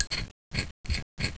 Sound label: silencer on; svencoop